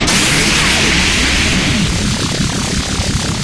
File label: quick fix invulnerable on; video games; source engine; tf